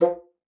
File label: BASSOON F3; Xsample VOL-6